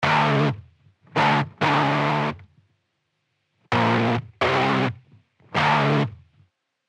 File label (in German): E-Gitarre Tape MELLO-FI Wasp Nest